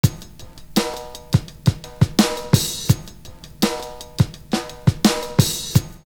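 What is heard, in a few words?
check the snare, loop; Univers Sons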